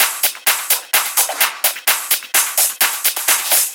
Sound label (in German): Drum Loop 128BPM FULL ohne BD; Vengeance - Essential Clubsounds Vol.5; VEC5 Drumloops; VEC5 Multi Loops 128BPM